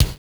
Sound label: Kick, Genesis; Zool